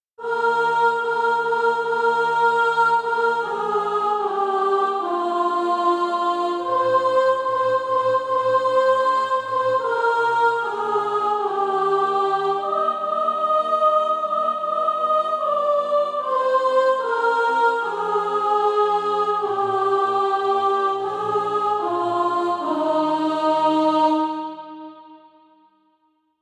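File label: soprano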